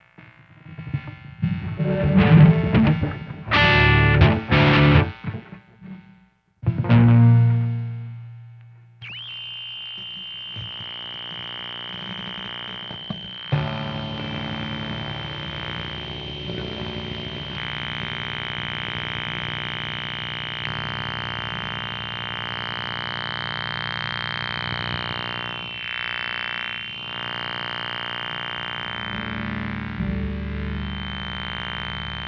Tv Emission

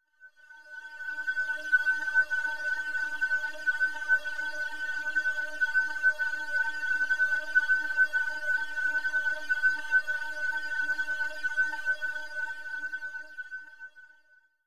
High Grainy Swirling Tone

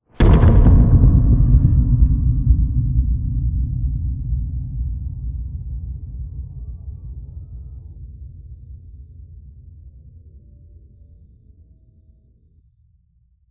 Echo Of An Open Summer Platform

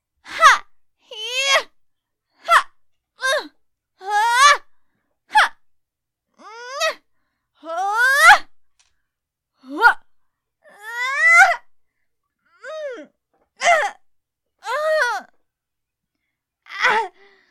More Battle Grunts

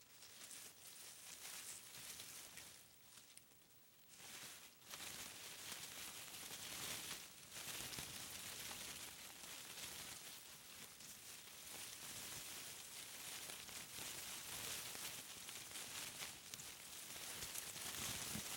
Foliage Leaves Rustle Brush Movement

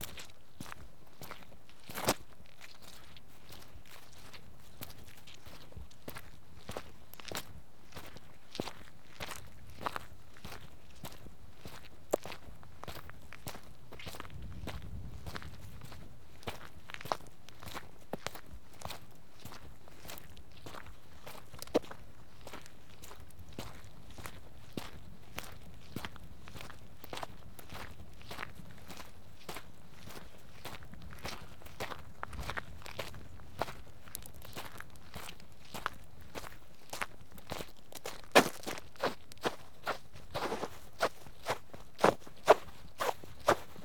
Footsteps Mud Gras Grit Wet

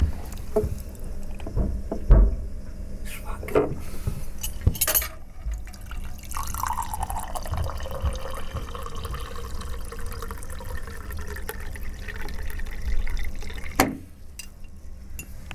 Tea Prep

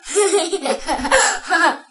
Laughs

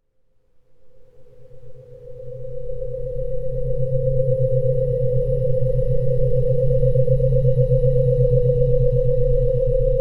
1950s Ufo

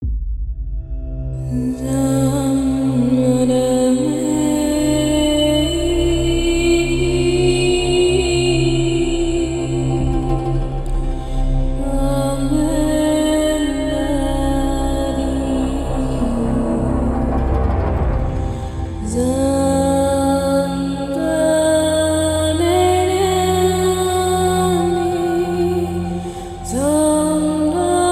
Cinematic Oriental Trailer

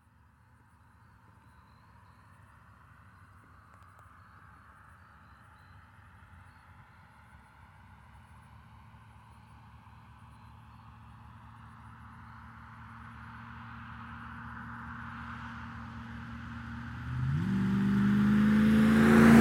1982 Z28 By Fast Accel

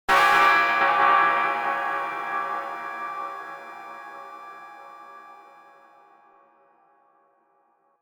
Metallic Stroke

